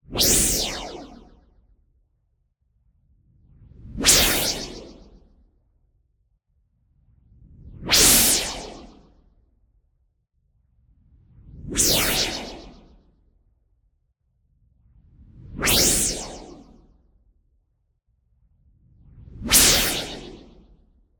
WHOOSH Noise Filter Bubble Soft; boom library; Anime Essentials

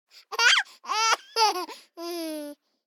BABIES LAUGH Boy 12 months Dynamic; Baby Boom

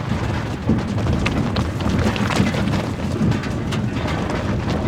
Auto Tires Rock Dirt Loop; Automobiles

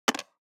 DICE on Plastic, Throw and Roll, Metal, 1 One Die; Samples; ArticulatedSounds; DICE Sfx Party (Extended)

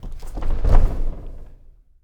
Boxing Ring Bodyfall

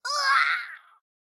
town human road hit; Blind Drive

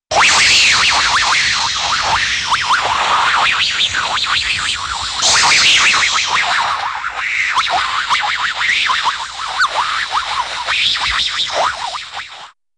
Funny Radio Static Or Deflating Effect, cartoon; Hollywood Edge; Cartoon Trax

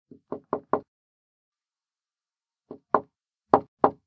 door knock1, household; NS Studios - ultra sound collection

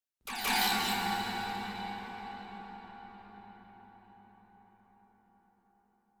Sharp Horror Chord; Hollywood Edge; sound designer's toolkit v2

